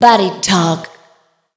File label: PHRASE, Voice Spectral